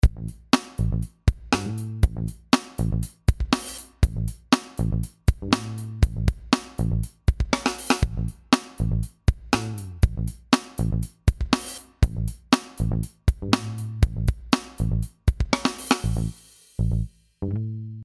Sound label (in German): Heftiger Komp